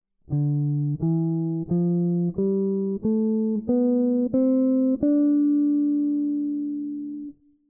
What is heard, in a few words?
jazz guitar improvisation; practice routine